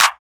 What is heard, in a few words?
Clap, Hip Hop and Trap; Ghosthack